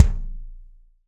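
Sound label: BD DRY, bass drum; Real Mega Drums; Best Service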